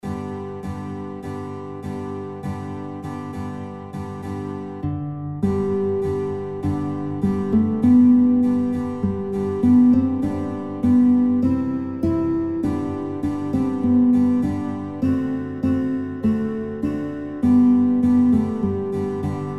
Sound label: Guitar, kinderlieder gitarre playalongs; Guitar Songbook; Sankt Martin